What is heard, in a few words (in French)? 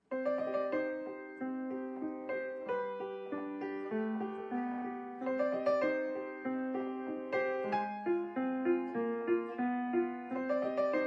Music box au piano